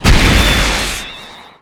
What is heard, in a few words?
Rocket Launcher Shot; SFX Kit; Weapons